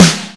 Snare, Drums

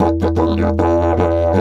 E 150, DIDGE RIFFS

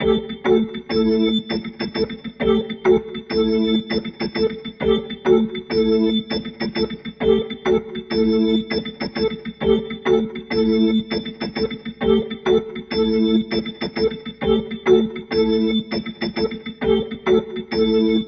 Rotor Riff, Organ

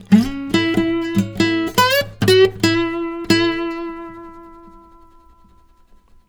BARI LICK, Blues Guitar

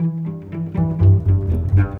Bass Cello Pizz, Cinematic; Sonic Foundry (Sony Creative Software); Orchestral Series VOL-3

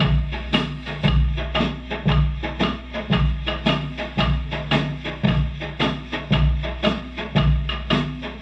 Loop, rhythmate, classic machines

